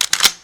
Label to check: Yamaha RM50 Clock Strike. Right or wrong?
Wrong